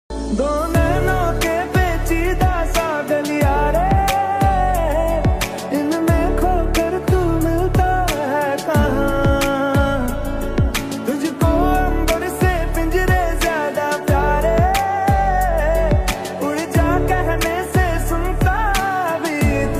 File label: ringtone reels song ringtone